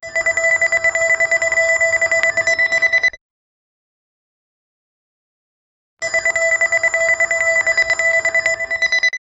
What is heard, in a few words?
a ringing notification fr, phone